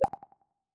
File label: Purr